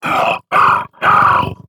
Taking Damage; Paladins; Dredge

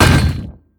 attack impact; Unbroken Fealty

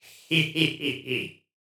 Laugh; Realm Royale; Warrior; Alpha1

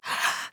Breath; paladins; Skye

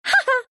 Wind laugh

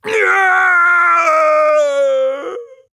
Falling

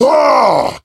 Heavy Attack; Battlerite; Glutton

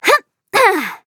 Grunt Jump; Paladins; Alchemist

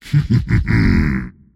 laugh; Pudge

